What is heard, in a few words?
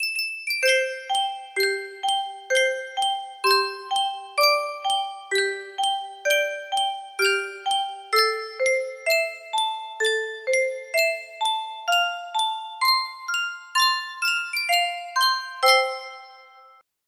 sankyo music box